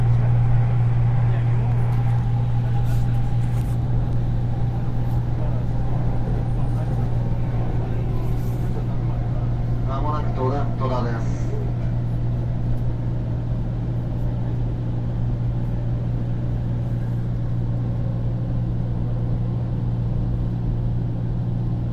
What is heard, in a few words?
on train